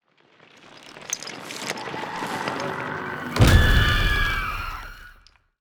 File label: puppet run horror